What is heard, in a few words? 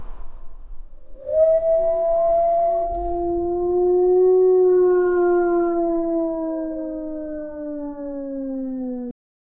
wolf howl